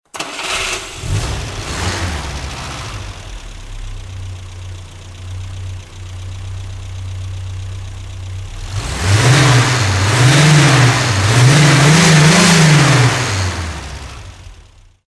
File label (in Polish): duzy fiat; dzwonki